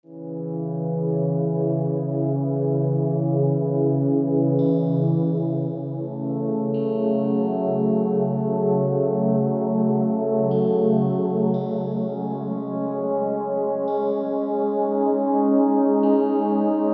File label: soundtck